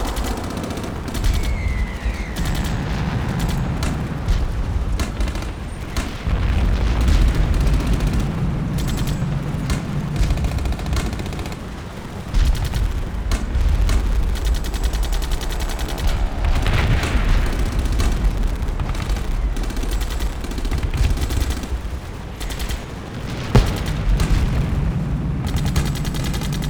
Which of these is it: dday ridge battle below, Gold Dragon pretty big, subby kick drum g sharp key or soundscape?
dday ridge battle below